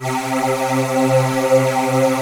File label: AIR VOX C2, VOICE; AKAI S-Series CD-ROM Sound Library VOL-7